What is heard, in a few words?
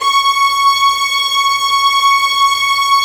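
violin section, Arco Violins; E-MU Producer Series Vol. 2; More Studio Essentials; Composer; Arco Violins III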